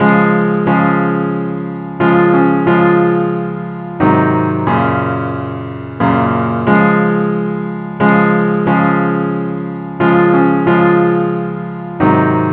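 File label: simple accompaniment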